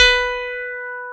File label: PIA ELEC. B4, PIA CP-70; Best Service Gigapack 1